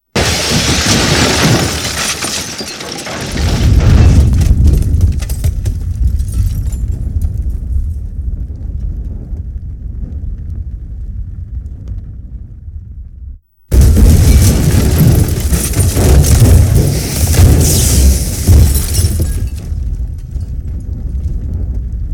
Explosion

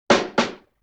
Rim Shot Recoil; Vintage Cartoon; Drums & Percussion

